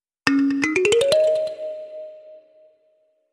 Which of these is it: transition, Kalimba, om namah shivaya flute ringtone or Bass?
Kalimba